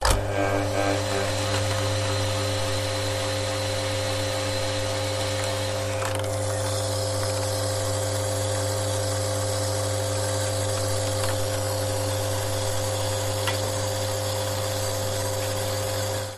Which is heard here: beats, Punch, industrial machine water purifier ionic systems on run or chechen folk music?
industrial machine water purifier ionic systems on run